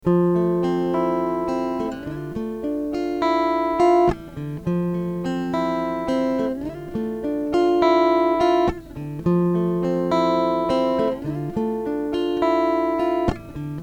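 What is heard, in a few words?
F 104 guitar arpeggios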